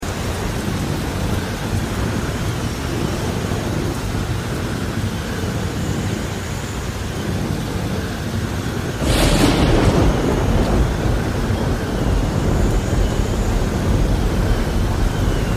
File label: rain and thunder, ambient sounds